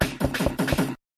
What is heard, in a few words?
House Building